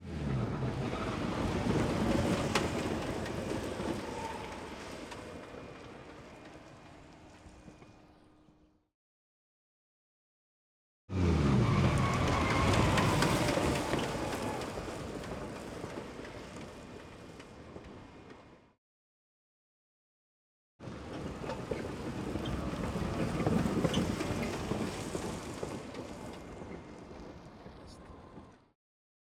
Skids Tarmac exterior rolling with flat tire handheld; Pole Position; Skids & Screeches Tarmac